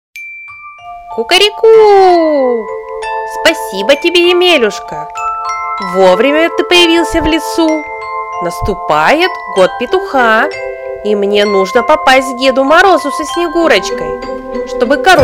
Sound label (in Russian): petuh